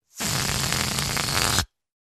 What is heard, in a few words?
Balloon air escaping farting sound